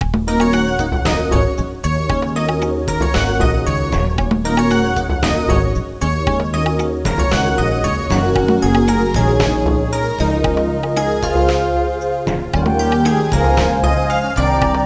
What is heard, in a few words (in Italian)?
suonerie contemporanea; musica contemporary